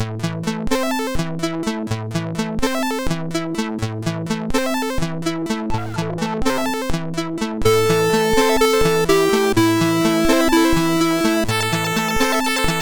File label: Pulsewave solo, MOS6581R4; sid